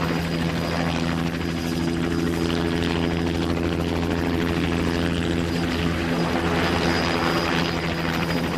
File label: helicopter loop far; Blind Drive